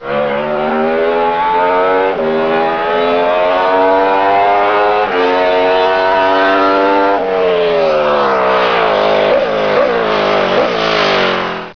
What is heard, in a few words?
britten ride on